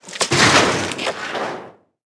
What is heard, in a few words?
metal fence; World of Tanks Blitz